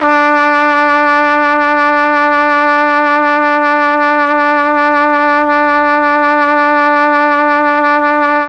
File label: D2 TRUMPET, mellotron; CHMB TRUMPET